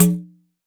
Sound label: Pandeiro; Roland BK-5; Percussion